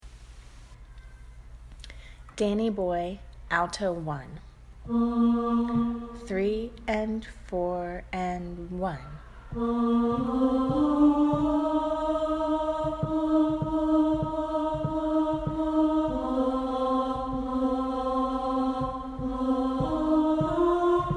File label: alto; danny boy